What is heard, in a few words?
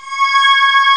string; Amiga Soundtracker Sample Packs